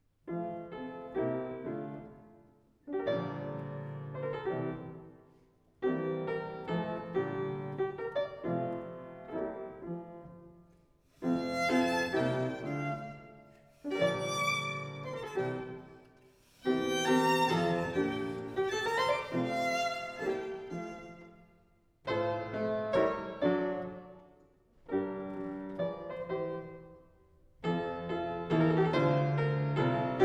Sonata for violin & piano in F major, Tempo di menuetto; Mozart